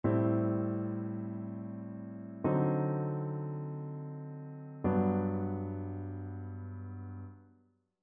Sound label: ii V I progressions; ear training